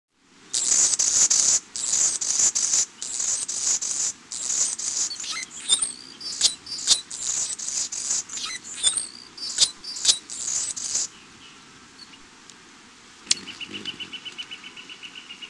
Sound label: annas hummingbird